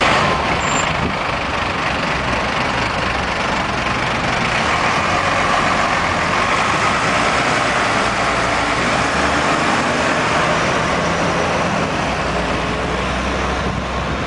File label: bus